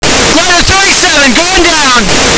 glider allied

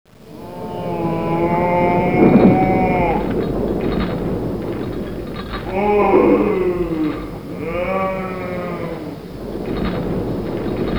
horror house ambience

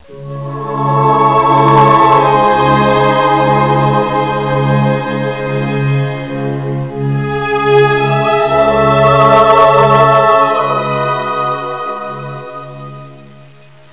axis win; dod ruelle; bessin

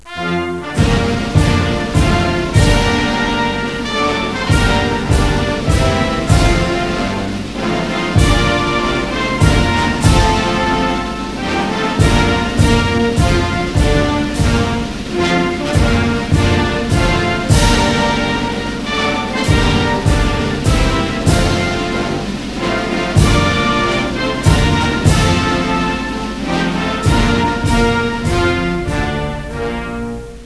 anthem usa

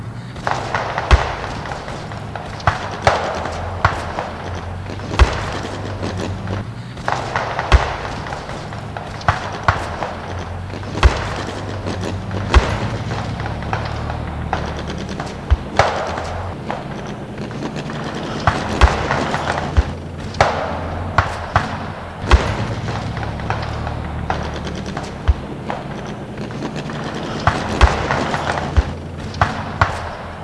ambience; dod

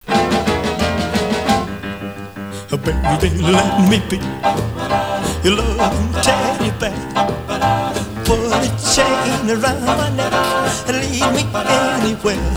allie win; graceland

